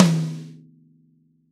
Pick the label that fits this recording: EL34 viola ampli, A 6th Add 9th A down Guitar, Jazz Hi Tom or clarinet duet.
Jazz Hi Tom